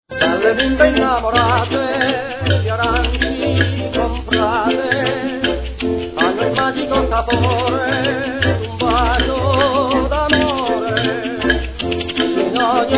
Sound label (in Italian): Orchestra Jazz Sinfonico